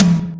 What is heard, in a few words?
tom, drums; virtual drum sounds; custom drums